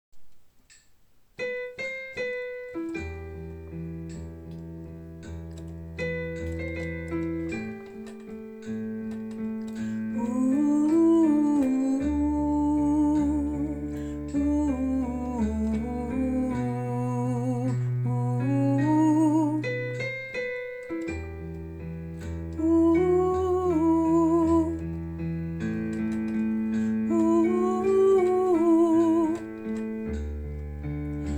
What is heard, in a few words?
alto; valses de vienne